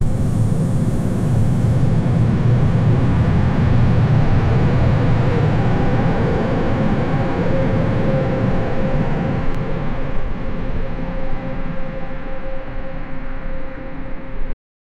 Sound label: a 60 rushing through the, aircraft